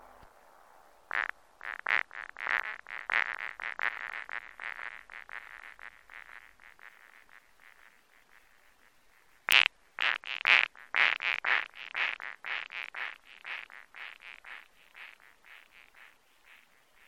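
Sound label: electric frogs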